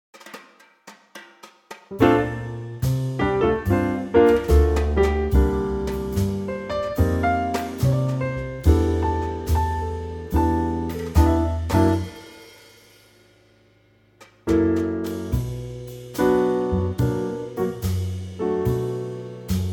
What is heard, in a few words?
D trio